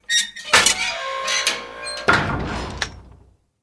Cage Close; Doodad

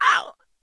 Harpy Wound

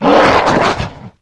Saber Worg Attack

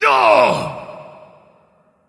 Wound; Teron Gorefiend; BLCKTMPLE

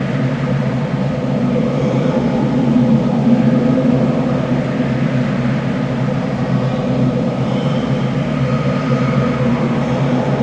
Draenei Spirit; Doodad

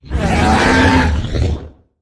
Phoenix Death

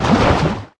Shark Attack

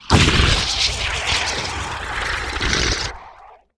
Crypt Fiend Death

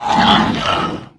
Shovel Tusk Attack

